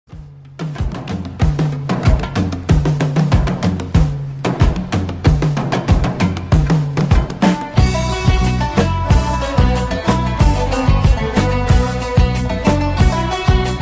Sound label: Disco snippet